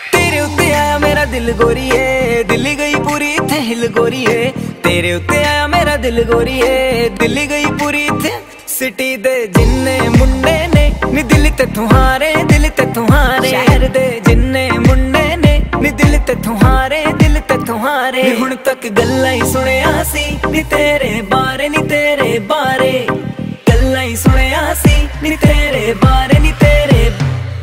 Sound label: Punjabi Ringtone